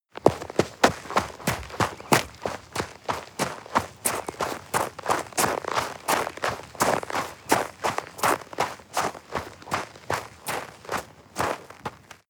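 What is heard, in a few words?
step snow run, footsteps; schritte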